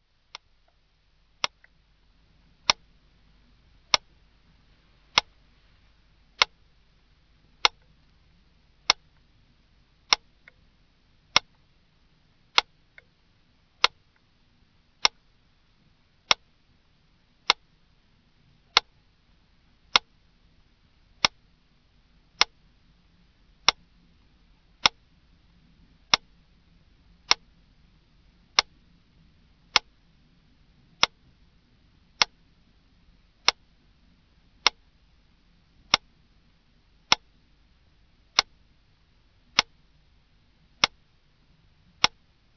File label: 40 beats per minute metronome 40 BPM; pendulum